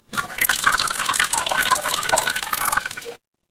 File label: water pour